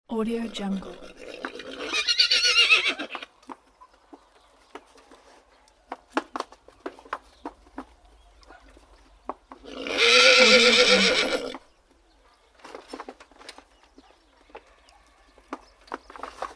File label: horse; crisstyna